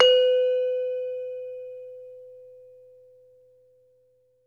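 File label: METALOPHONE, Pitched Percussion; E-MU Producer Series Vol. 7 – Old World Instruments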